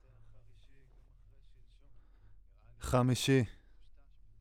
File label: Voc OD